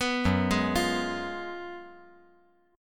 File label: Gb Major 7th Suspended 2nd Suspended 4th, down